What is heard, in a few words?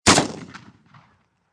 Shotgun 12 Gauge Saiga 12 Combat Shotgun Single Shot Distant Perspective; Shotgun Sound Effects